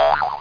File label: pogostick